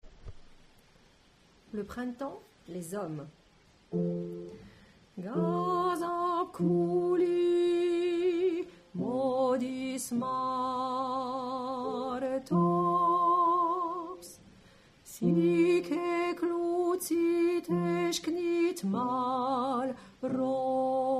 Hommes

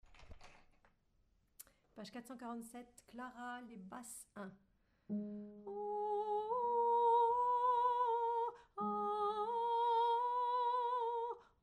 Basse

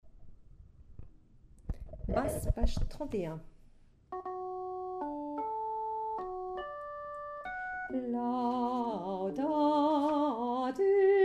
psaume Basse

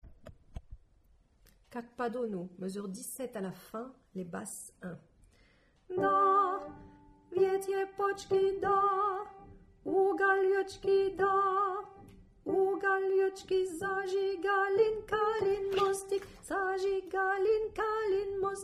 Basse

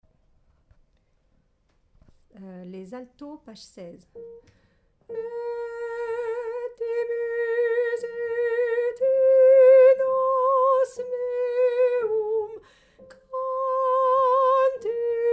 psaume Alto

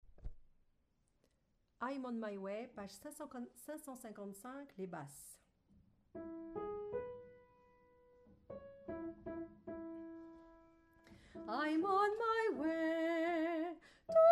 Basse

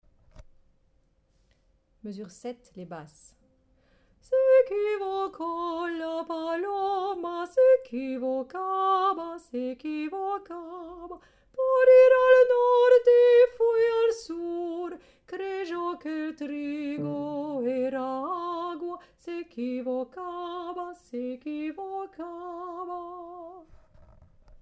paloma Basse

